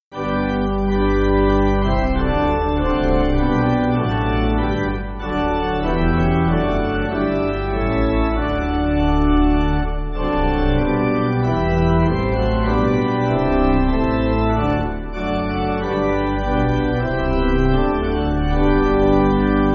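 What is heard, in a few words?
Pipe, Pipe Organ, Music Backing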